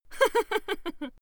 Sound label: female laugh